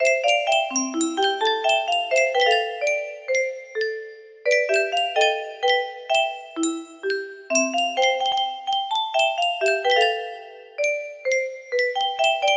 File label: Yunsheng Music Box, Unknown Tune